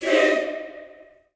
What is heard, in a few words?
choir